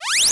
open, interface sounds; kenney

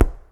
nutfall; yo frankie

